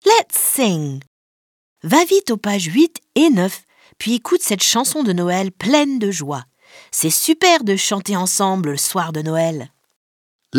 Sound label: Let's sing Jingle Bells